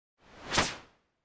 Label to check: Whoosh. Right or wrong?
Right